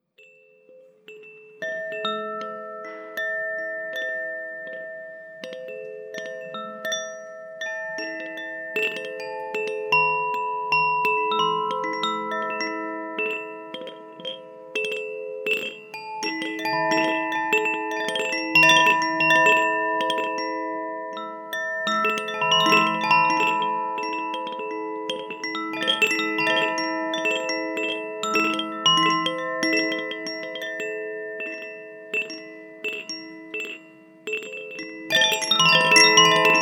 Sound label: wind chimes aria sounds; koshi